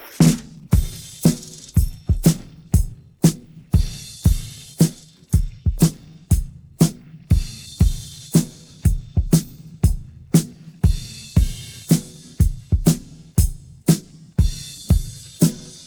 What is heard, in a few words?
drums pattern